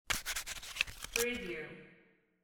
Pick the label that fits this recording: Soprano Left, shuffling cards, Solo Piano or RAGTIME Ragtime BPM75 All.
shuffling cards